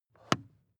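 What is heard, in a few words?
sports car interior pedal clutch step soft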